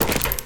movement run; smite; Arachne

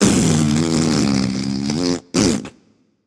tongue

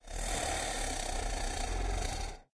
boar lobby defeat react emote breath; smite; god artemis

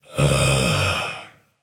ugh; chaac

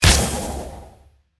impact; smite; chiron

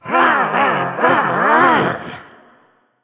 grumble; smite; janus

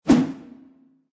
swing; smite; odin

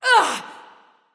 grunt hit; smite; voicepack

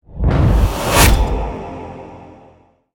activate; smite; baron samedi

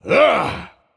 yay; smite; voicepack; ravana

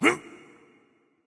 grunt jump; smite; thor

